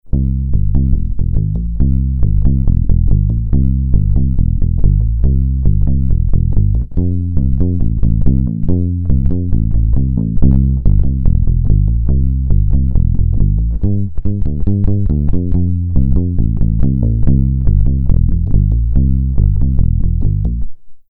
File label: Bass Fretless Blues Finger